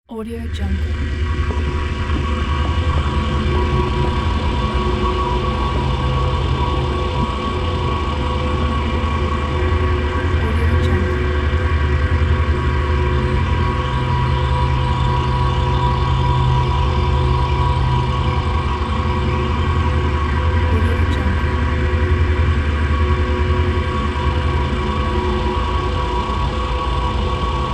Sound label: dark background atmosphere